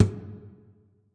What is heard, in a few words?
Nylon Hit, Guitar Body and String Hits